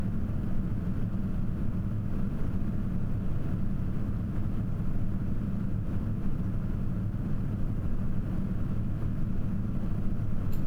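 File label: 60mm Max RPM, fan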